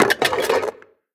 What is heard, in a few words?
Cans Jump Scare; The Inn